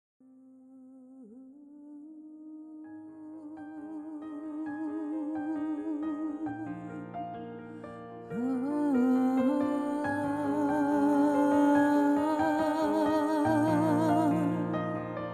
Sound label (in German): Saxophon Klangprobe